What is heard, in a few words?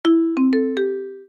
Break, notifications; Gome; U7